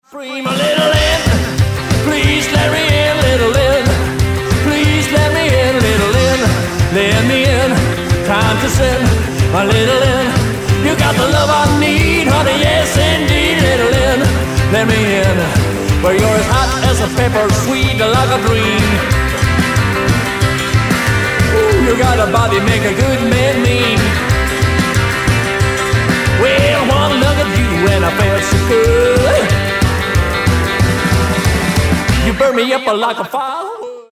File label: Rockabilly Party